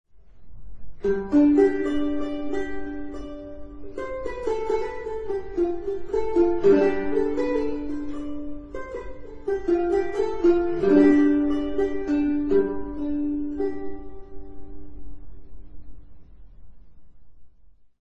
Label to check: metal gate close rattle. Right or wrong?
Wrong